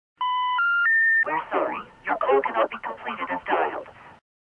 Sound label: error, telephone